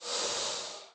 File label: liz hurt